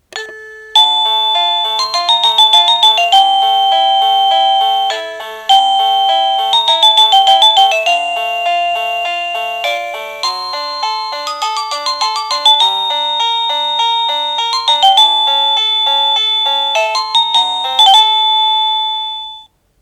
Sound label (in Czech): melodie